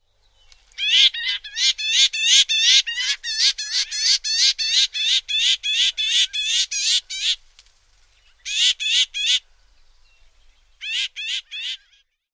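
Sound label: calls, Pinyon Jay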